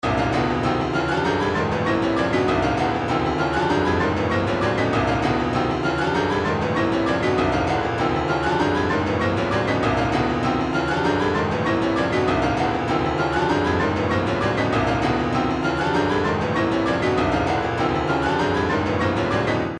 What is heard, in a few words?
retro funk 098, midi; tude hifi